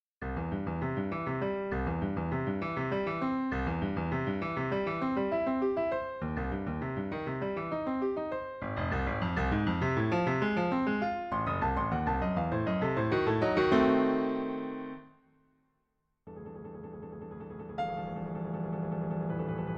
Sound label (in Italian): Passagio per pianoforte; per Omnibus sic tonos